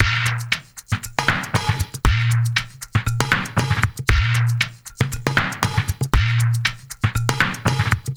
AQUAFUNK NO DRM; Spectrasonics; Liquid Grooves 1 & 2; 117 AQUAFUNK

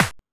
Techno F, Drum Machines, EMU XL-7

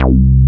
JNO TEK C3, TEK BASS; Drums In Your FACE!

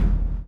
CHAIN; Spectrasonics - Backbeat

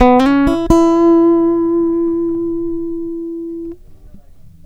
Guitar, Trip-Hop Loops; Sonic Foundry; Rondo Brothers Trip-Hop Loops & Samples; Hooked On Hookers

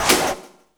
SD, 170 BPM; Ueberschall; Drum & Bass Resonance

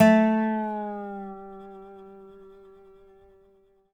dobro; DOBRO DOWN; Country

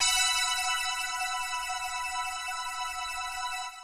125 pad E, Keys, Nu Jazz House; PowerFX - Nu Jazz House

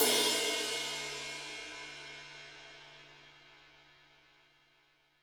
HiHat & Crash, Roland TD-20; HiHats

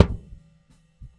Kick Ride Bed; Airwindows

